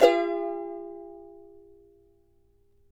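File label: CHARANGO, D major, up; Spectrasonics; Hans Zimmer Guitars VOL-2; CHARANGO CHD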